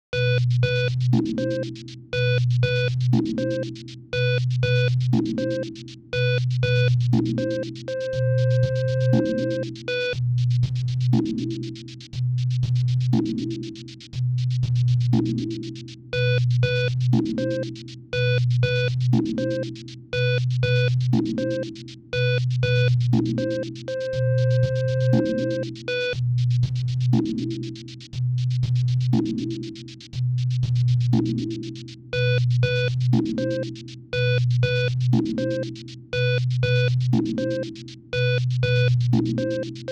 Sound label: Sine Wave Song